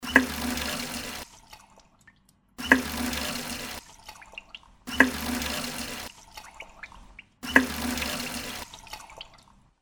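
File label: Washbasin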